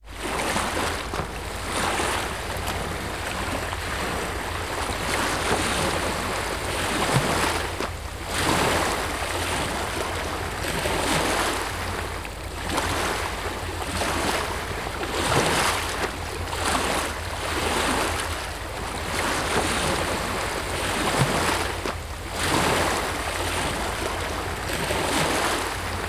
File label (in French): Mer petites vagues